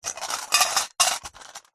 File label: food candy boiled sweets unwrapped in metal scales bowl movement hand